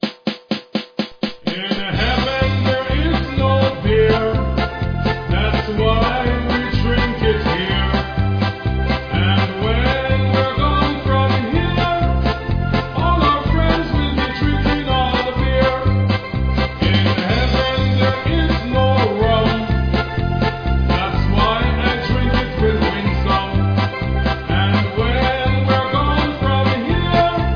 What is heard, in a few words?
Polka Medley